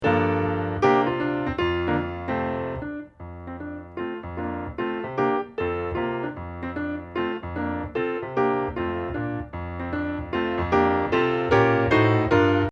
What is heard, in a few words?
accomp; good news chariots a-comin